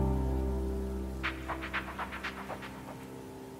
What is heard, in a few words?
Notification Tone; Huawei